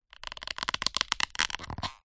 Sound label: foley plastic squeeze stress creak against dense foam; zapsplat